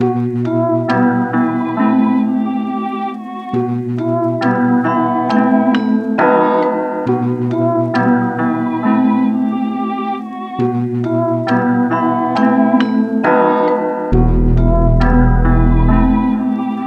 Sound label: 136 BPM E Min, Melodies Dark; Purple; Cymatics; ZODIAC Beta Pack